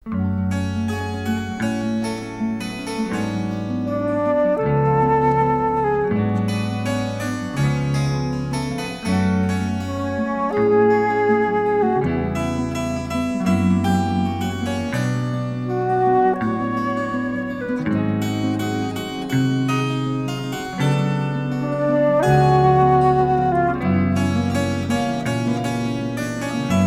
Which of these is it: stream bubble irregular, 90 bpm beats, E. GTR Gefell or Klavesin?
Klavesin